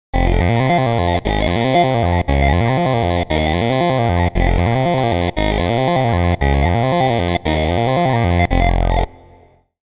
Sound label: Lead